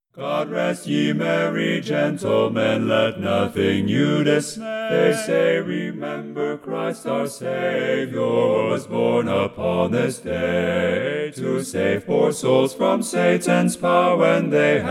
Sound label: Bass, tracks ttbb; God Rest Ye Merry Gentlemen